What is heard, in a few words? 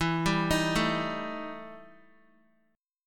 E Minor Major 7th Double Flat 5th, Guitar; arpeggiated; JGuitar